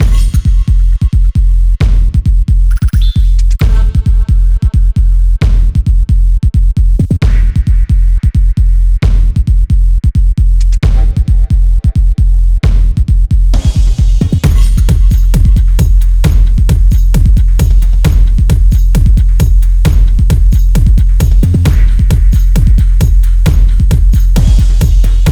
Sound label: Flawless Instrumental; Anger Music Library